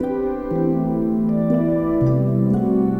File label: Pretty Poink, Pastoral; Sonic Foundry (Sony Creative Software) - Friendly Fire